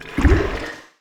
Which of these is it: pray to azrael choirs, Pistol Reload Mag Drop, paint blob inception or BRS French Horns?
paint blob inception